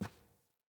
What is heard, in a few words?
Boot Walk Default, Footsteps; Characters; Enemy; Boots; Sinecure; Melee Grunt